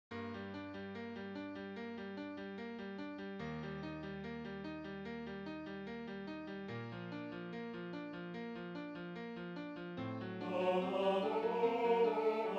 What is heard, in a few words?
I Heard the Bells on Christmas Day Tenor Bass